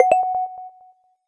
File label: pause retry click; 『DTMoon』